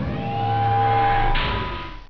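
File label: DOOR LOCK; Zoemtonen hisses pingen woesjes tikjes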